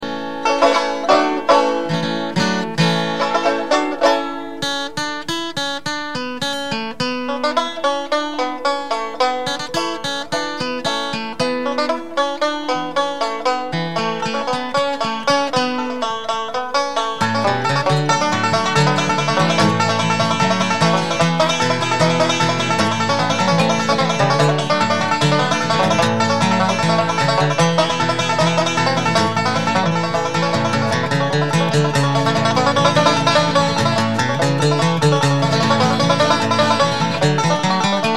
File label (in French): Musique Du Film Banjo Guitare